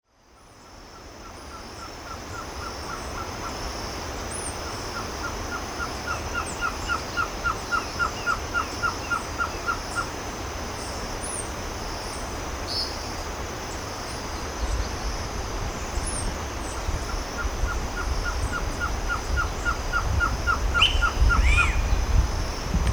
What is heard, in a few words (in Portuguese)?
Trogon surrucura Surucuá variado